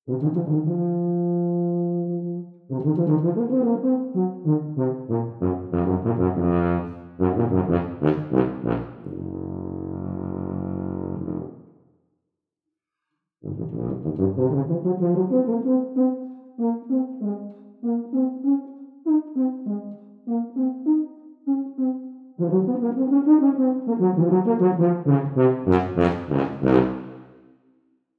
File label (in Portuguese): tuba; Conhecendo Instrumentos Musicais